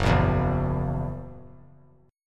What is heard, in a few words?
F sharp 7th, down; strummed; JGuitar